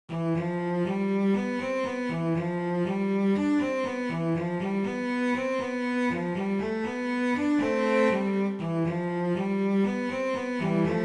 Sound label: opus in c major